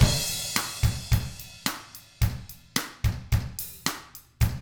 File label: POP, Drums; ILIO; Double Platinum Drums 1